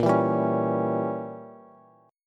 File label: Bb Diminished 7th Bb Bass; strummed; JGuitar